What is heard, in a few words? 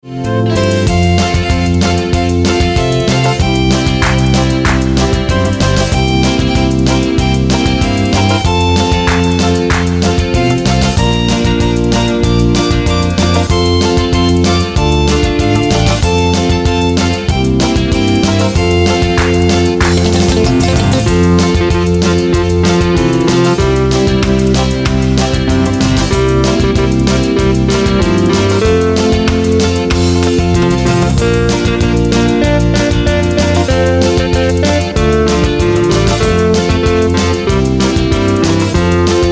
Karaoke